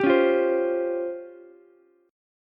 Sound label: Db Major 7th Flat 5th, Ukulele; 12,13,13,15; DbM7b5 strummed; JGuitar